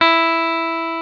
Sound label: Elec. Guitar E3; Proteus 2000; Multisamples; Digital Sound Factory Proteus 2000